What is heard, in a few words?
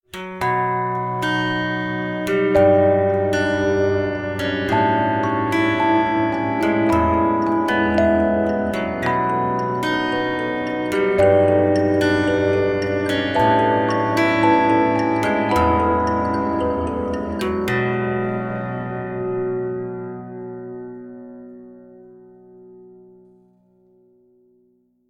campfire resting peaceful; curious expedition 2 macos ps4 switch windows xbox one xbox series xs gamerip 2021